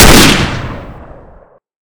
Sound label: garand; garrysmod